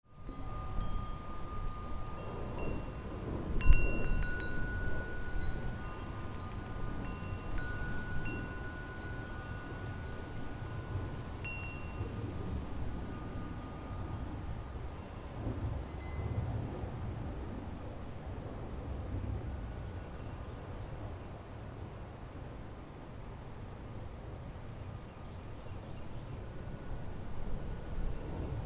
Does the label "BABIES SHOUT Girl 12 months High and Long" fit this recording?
No